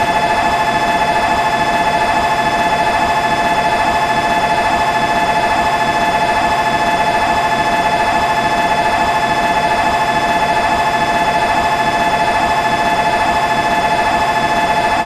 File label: Shinkansen Departure